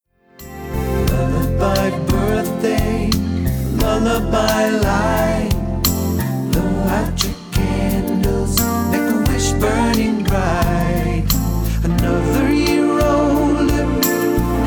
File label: Lullabye Birthday